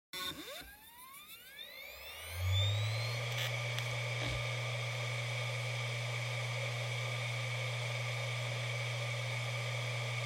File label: Hard Disk Drive (Operating Noise)